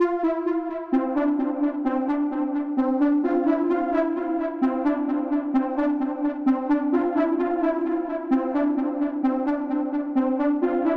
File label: 130 BPM F Min Lead, 1980s; Melodies; Loop Stems; Cymatics; GHOST (Beta Pack); Boardwalk